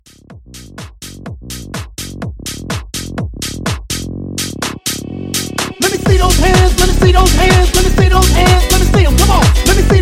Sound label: Club Hype 125; Club Hype Edit